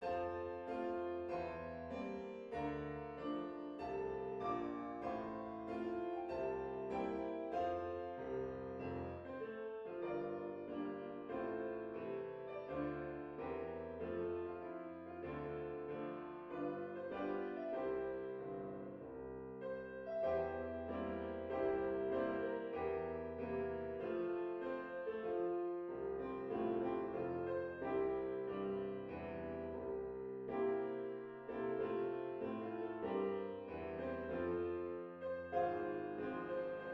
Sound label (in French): hymne national portugais; keil alfredo; portugueza